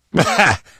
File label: laughter, survivor voice; source engine; left 4 dead 2; manager